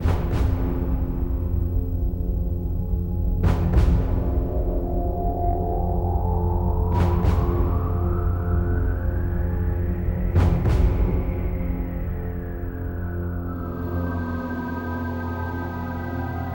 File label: H music loop